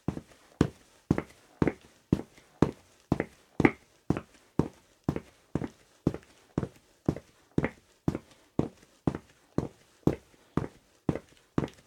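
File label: BOOT CONCRETE CLEAN WALK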